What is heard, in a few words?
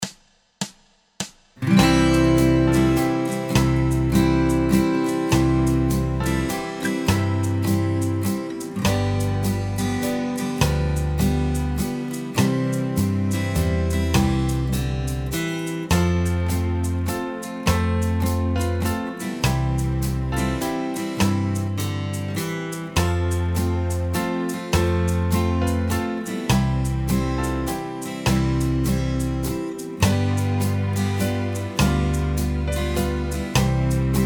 Silent Night Minus Guitar; performance backing track